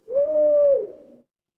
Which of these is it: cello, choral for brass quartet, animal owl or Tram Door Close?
animal owl